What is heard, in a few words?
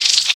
Naga Hit; The Battle for Wesnoth